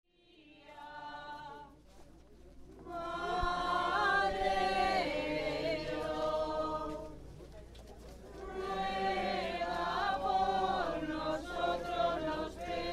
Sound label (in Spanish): rezo rosario y canto; javali viejo; aurora